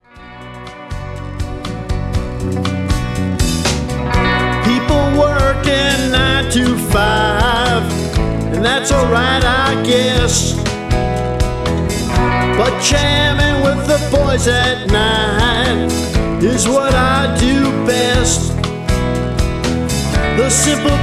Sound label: Rock 'n' roll